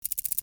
ticking six

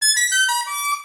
close encounter

